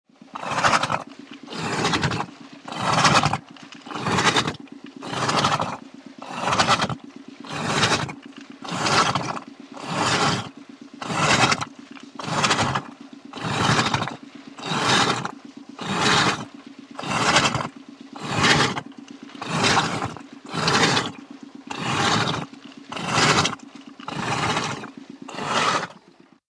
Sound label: Water Pump Int, pumps